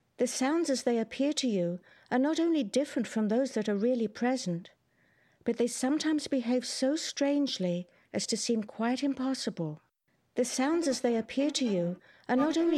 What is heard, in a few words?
corpus Strings